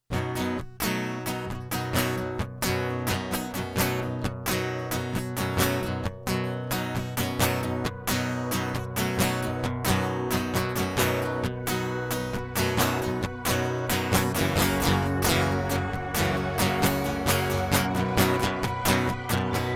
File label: Instrumental